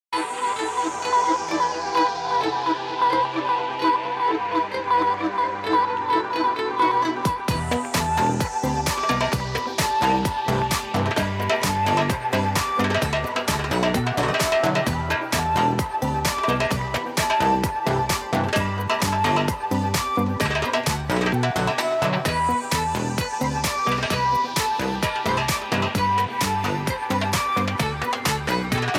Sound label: Arab Music